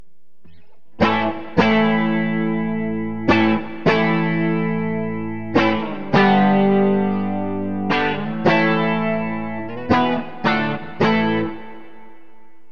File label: bridge clean; Burny